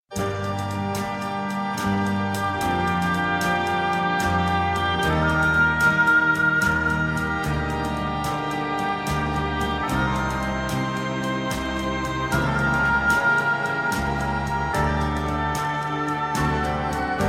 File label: Adagio In G Minor